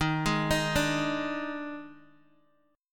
D sharp Major 7th Suspended 4th, D sharp, down, Guitar, DADGAD